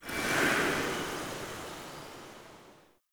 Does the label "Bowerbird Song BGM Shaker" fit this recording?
No